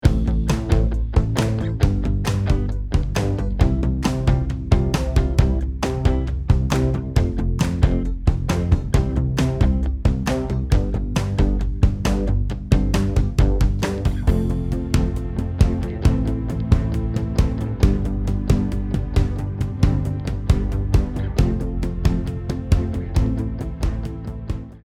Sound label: Pop